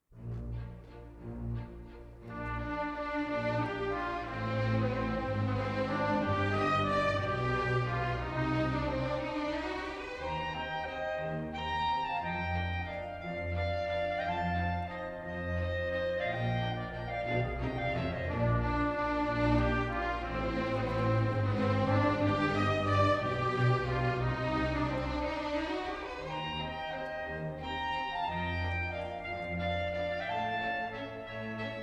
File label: Tempo di Valse; Tchaikovksy - Swan Lake (2004)